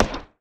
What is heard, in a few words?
axe mining tree; yumako; Factorio